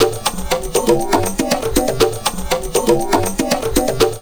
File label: Drum Loops Crazy Processed, 120; USB Soundscan vol.07; AKAI